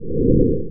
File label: SLURP; Mindbender (1989)(Gremlin)